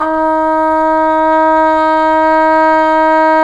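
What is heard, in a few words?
brass cup mute trumpet, dry; Roland L-CDX-03 Disk 2; Cup Mute Tpt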